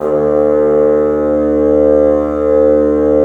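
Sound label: wind bassoon; LA Composer vol. 3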